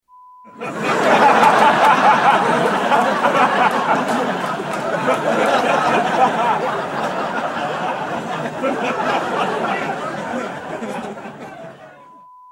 Laughter

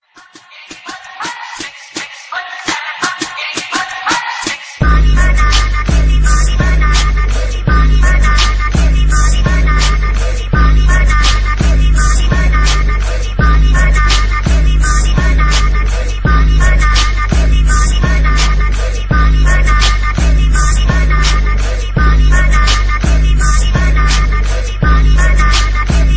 INSTRUMENTAL

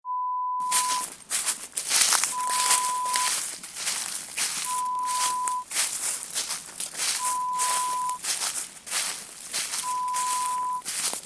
Footsteps leaves

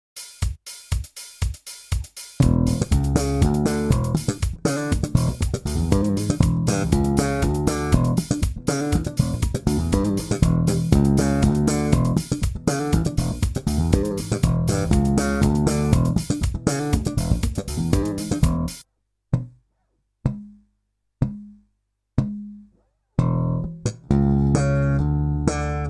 slap in E

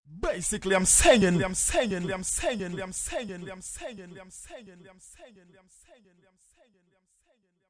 busy signal

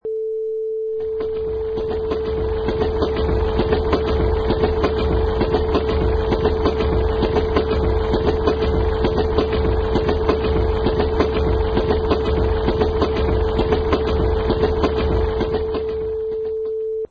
Train Passing

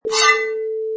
Drawing Sword

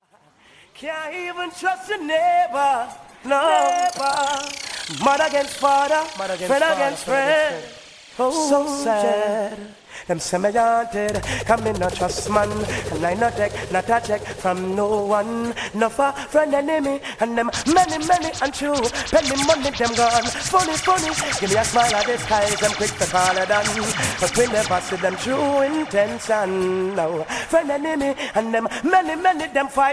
Reggae Souls Mixtape

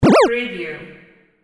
button

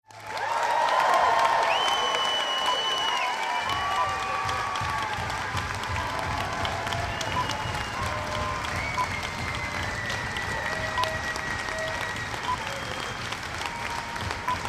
Applause and Foot Stomp

